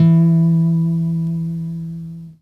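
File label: classical solo, guitar; Greytsounds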